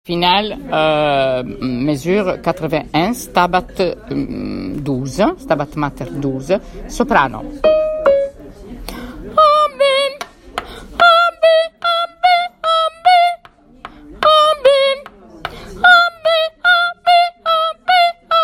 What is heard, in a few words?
soprano; Stabat Mater 12; Final mes 81